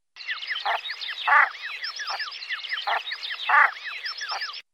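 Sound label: peganka golos, common shelduck voice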